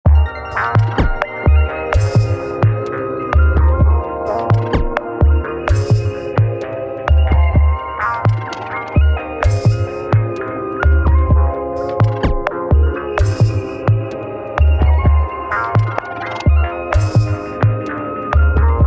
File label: processing wait music